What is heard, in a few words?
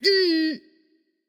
nv tongue; smite; voicepack; morgan le fay